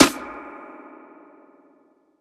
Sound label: snare; dough-samples; eddyflux